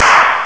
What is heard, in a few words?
claps; Amiga Soundtracker Sample Packs